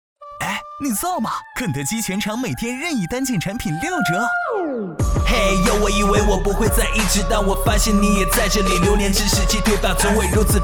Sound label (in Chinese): 说唱广告; 肯德基